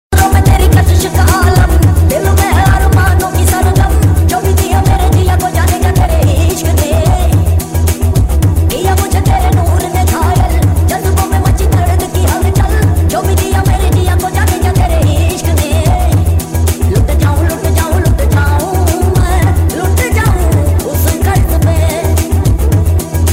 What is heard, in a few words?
Funny; Karzz